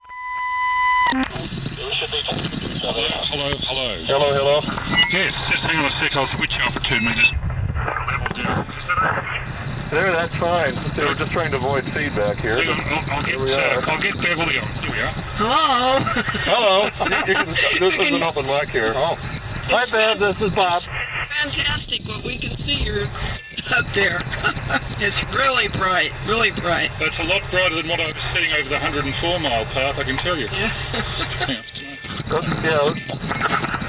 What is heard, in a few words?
optical qso; yucca valley; initial